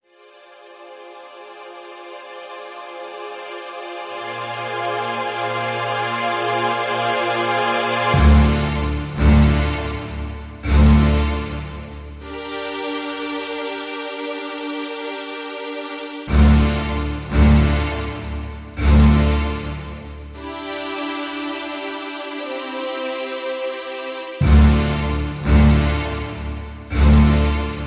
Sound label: bgm ambient intro; story